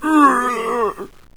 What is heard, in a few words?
zombie death; doom3